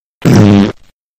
fart

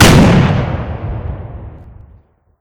explode

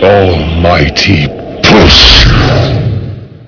push; freak fortress 2

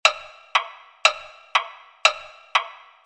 time travel use

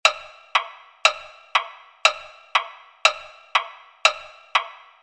timetravel use

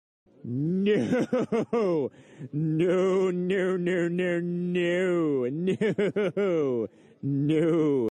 noooo

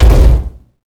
step, baron of hell; doom4

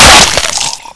hurt, zombie; dod

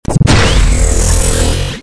pong

